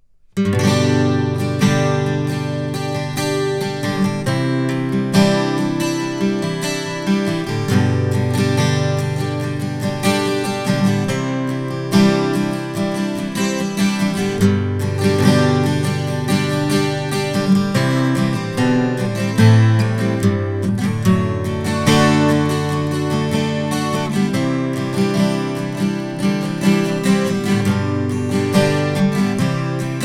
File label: guitar